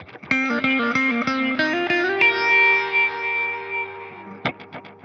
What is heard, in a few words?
Tele Dub, 95bpm Guitar Dub; musicradar; dub designer